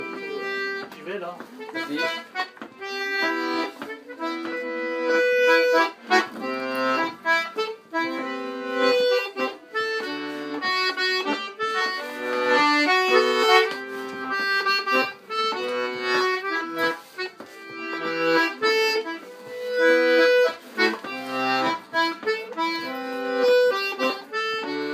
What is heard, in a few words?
Mazurka; La Paire De Saulxures